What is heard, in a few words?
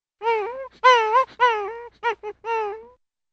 whining; pluto